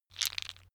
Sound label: stone gravel clatter short; natur-tiere; steine